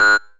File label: BUTTON; Multinet